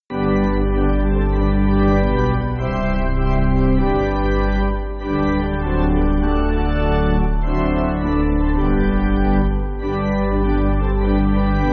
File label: Pipe Organ, Backing Files for Churches; William M. Runyan